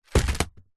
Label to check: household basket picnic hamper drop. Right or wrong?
Right